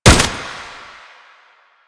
Tank Turret Shot; Pintel; Weapons; Warframe; Lasrian

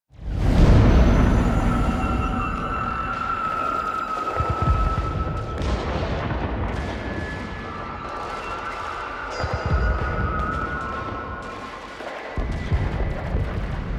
Ambience; RJ Arrives

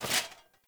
Foley Dual Dagger Taunt; Raider; Sinecure; Enemy

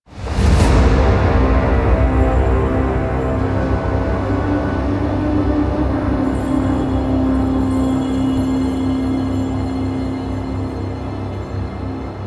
Back Room Reveal End Stinger Cue; Warframe; DE; Entrati; TennoCon2023

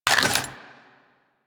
Investigate Pickup Gun; Warframe; Cephalon Weave

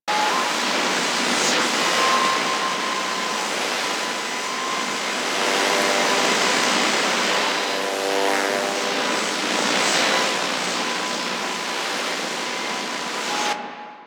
Air Drop Loop; Nora Liset; Liset Powers; Vehicles

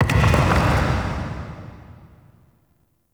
Distant Stone Texture, Crypt Ambience; One Offs; Champion Crypt

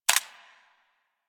SMG Mech; Weapons; Warframe; Tenno; Sundial

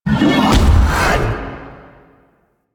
Rising Blades Cast; Warframe; Warframes; Pax Duviricus

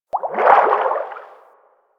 Fish Tank Movement Quick; Liset Personal Quarters; Warframe; Hub

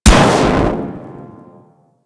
Electro Mag Explode, Weapons; Warframe; Corpus

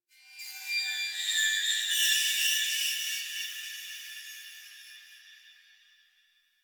Texture Interior Small; Sentient Lair; Ambience; Warframe

